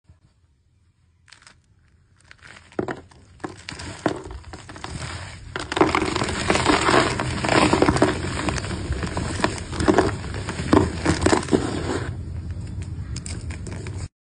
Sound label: chalk crunch; اسمر